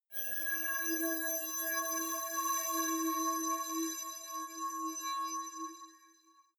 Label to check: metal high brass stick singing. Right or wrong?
Right